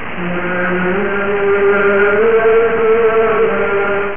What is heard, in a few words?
chanting; Theldrow